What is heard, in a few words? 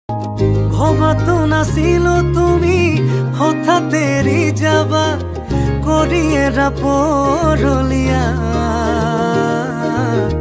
Song Ringtone; Telugu song